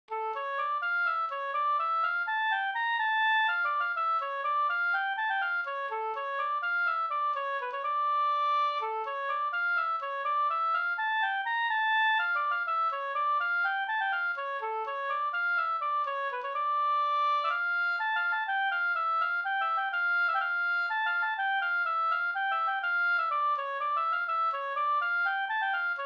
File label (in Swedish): polska springlek; e Hallhans Per Nilsson Transtrand